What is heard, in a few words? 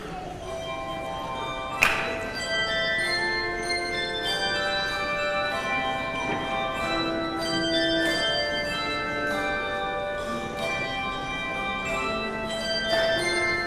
Handbells